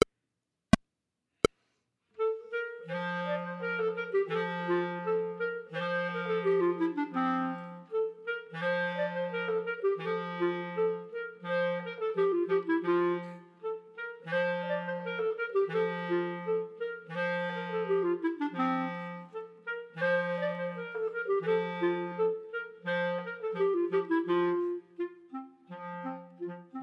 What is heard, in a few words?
Gavotte en Rondeau, Chamber Music for 3 Clarinets, minus 2nd part; Dandrieu; Caboodles of Clarinets, Trios, Vol. 3